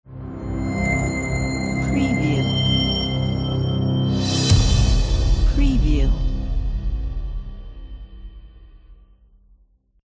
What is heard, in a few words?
cinematic treasure logo